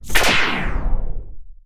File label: BLASTER Complex Fire Robotic Charge Deep Release